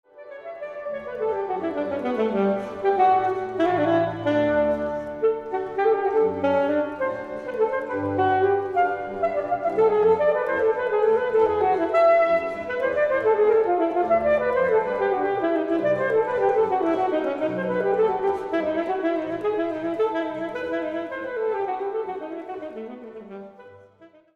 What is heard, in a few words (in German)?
Floetensonate